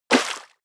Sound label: step walk water; schritte